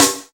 juicy snare single hit c sharp key

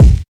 clean bass drum c key

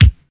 original kick drum sound c sharp key

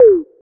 short tom drum single hit a key

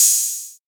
small reverb open hat sound g sharp key

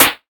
trebly acoustic snare c sharp key

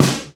dry acoustic snare sample D key; snare drum samples

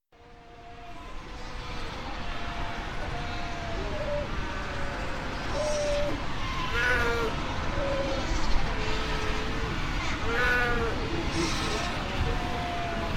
fur seal colony; soundscapes

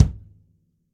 punchy kick drum c key

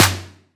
juicy snare drum f sharp key

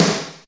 acoustic snare sample f key; snare-drum-samples

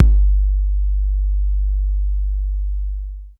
big room kick single shot g key; Samplerate 44100 Hz

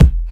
tight lows kick drum sound e key; kick drum samples

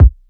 short kickdrum sample c key

